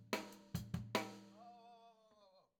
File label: Tom